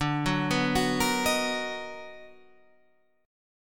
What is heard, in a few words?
B Major 7th D; Bmaj7/D arpeggiated; JGuitar